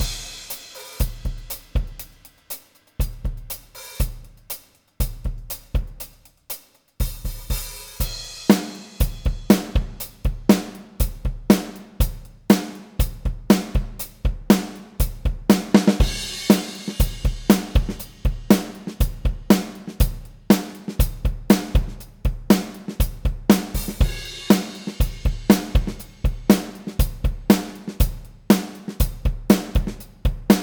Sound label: DRUM STEREO